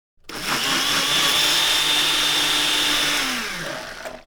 Kitchen blender mixer food processor start and shut off